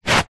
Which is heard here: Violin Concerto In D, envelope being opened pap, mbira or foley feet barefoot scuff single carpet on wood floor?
foley feet barefoot scuff single carpet on wood floor